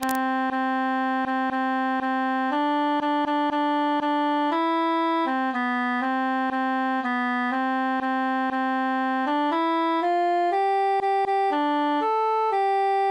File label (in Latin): altus solo; since first i saw